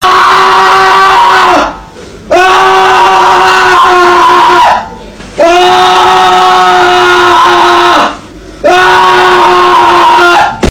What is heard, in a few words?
brainrot shouting